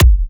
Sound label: Bassdrum